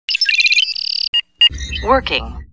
beeps working

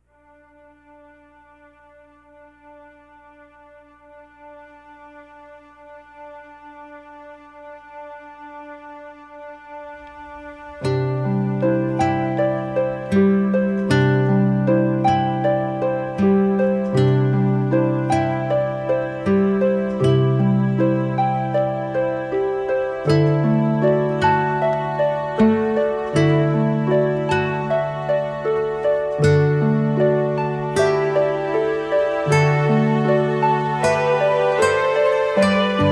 Harp and Guitar; Twin Peaks

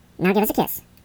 Kiss

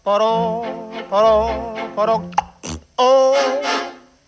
French Horn

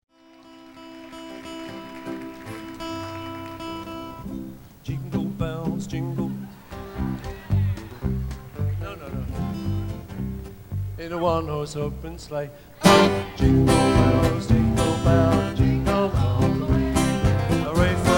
Jingle Bells

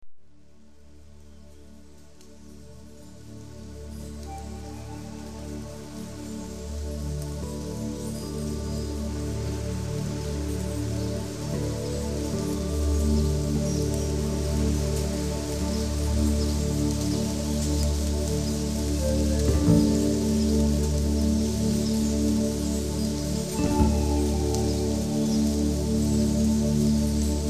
Rain Music; Atmospheres

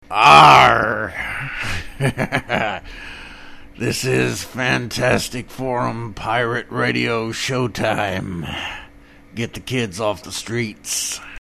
Arrrggghhh

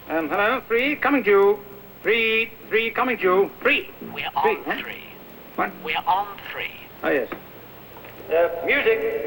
music

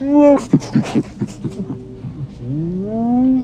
laff; Chewbacca

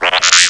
R2 Raspberry; Star Wars; R2-D2

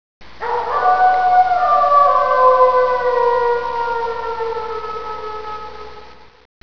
piercing howl

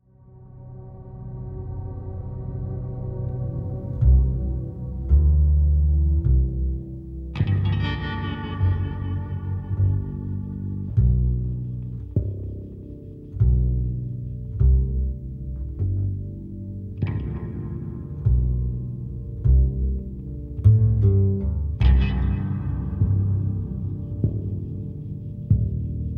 Red Room Music

